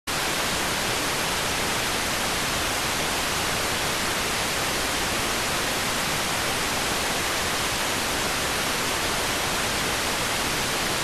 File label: rtty rx